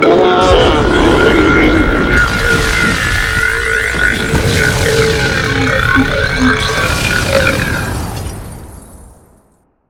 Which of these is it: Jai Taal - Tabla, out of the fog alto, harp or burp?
burp